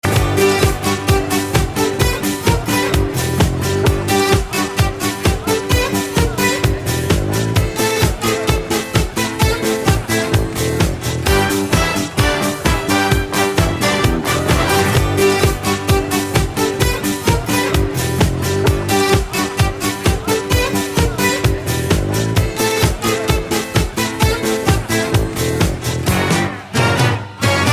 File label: Greece Rythm